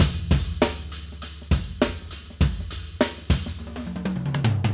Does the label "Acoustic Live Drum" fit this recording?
Yes